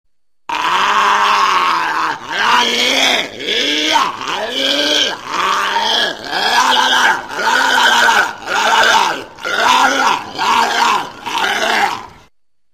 Funny walrus sound

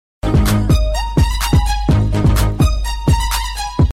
New Hip Hop

